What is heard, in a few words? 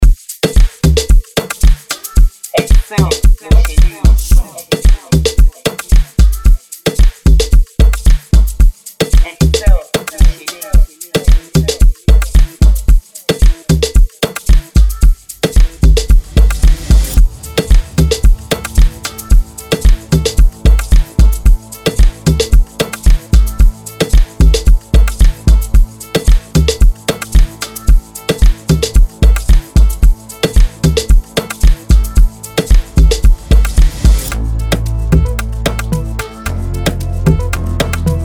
beat Instrumental